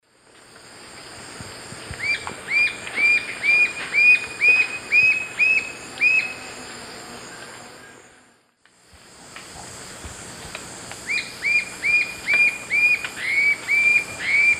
Galbula chalcothorax, calls; S. America; Eastern Lowlands

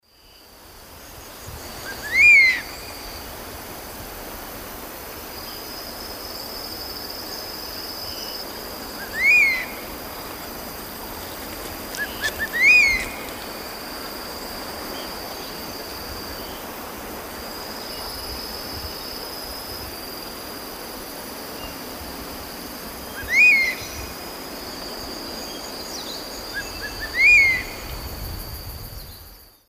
Spizaetus tyrannus, flight calls; S. America; Eastern Lowlands

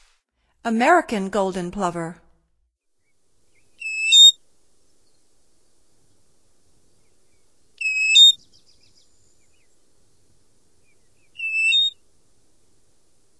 American Golden Plover; North America; Stokes

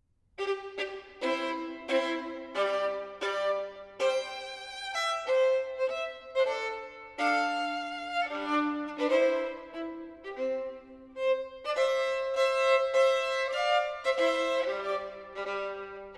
La Marseillaise arr. for violin solo; Rouget de Lisle

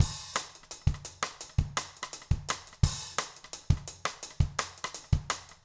jungle 170

